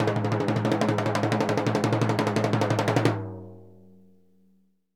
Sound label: TIMBLO LON, Synclavier Percussion; ILIO; Synclavier Percussion 2; World & Orchestral